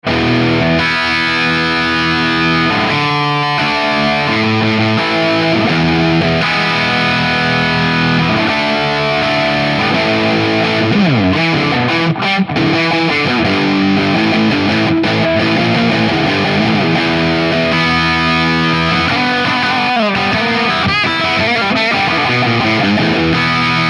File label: bogner shiva gain